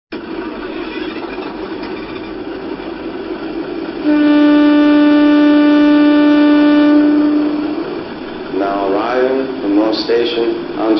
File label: RDC BUDD, trains